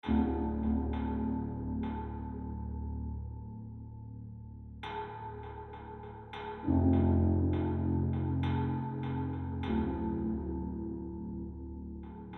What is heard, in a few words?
Tension Block